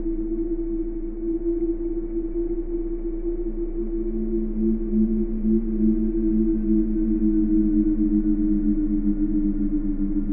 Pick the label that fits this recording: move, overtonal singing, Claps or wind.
wind